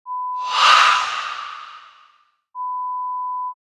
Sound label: Ghost